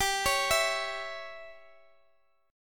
C sharp Diminished G

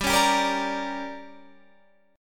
D Major 7th Suspended 4th Sharp 5th, chord

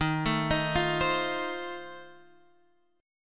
F 7th D sharp

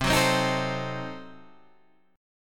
C Diminished 7th C down Guitar Standard; strummed; JGuitar

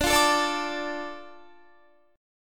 D Minor D Guitar; Dm strummed; 22,20,19,19,21,21

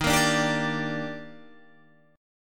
Eb Major 7th, Guitar, Open G

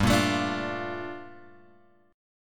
G Minor 9th, Guitar; Gm9 Chord {3 1 X 2 3 1} strummed

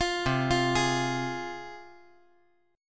C Suspended 4th Sharp 5th, C, down, chord

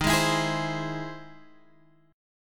E Augmented Major 7th

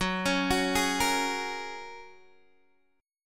F sharp Major Add 9th, Guitar DADGAD

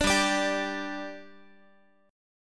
G 5th G, Guitar; G5 strummed

